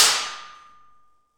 Aluminum Wall, FX Perc; Roland L-CD701

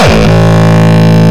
Longkick, kicks

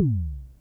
SYNCUSSION

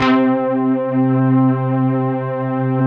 SYN, Analog; Sound & Vision; Gigapack I CD 2

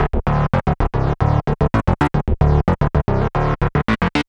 112 Em, Melodic Loops; M8; Electronisounds

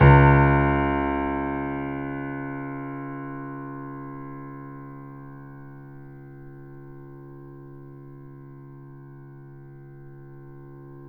Mp C#1, Grand Piano; AKAI S6000 CD-ROM - Volume 2; Grand Piano 256M

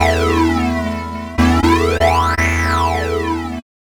Phasor Bass; Sonic Foundry (Sony Creative Software); The Electronic Music Manuscript Collection; Bass Foundations

